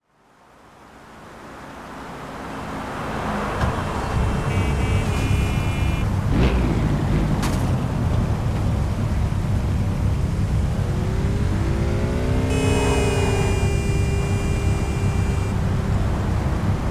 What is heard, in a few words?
mitsubishi lancer evolution 10; TDU-1